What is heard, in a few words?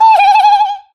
unnerving sound but sped up